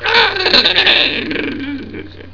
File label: drown; Alien Arena; drfreak